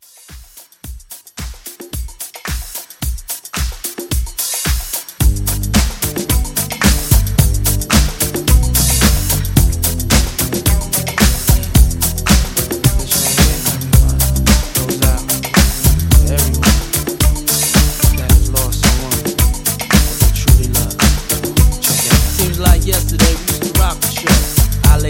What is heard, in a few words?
90s Hiphop Re Drum 110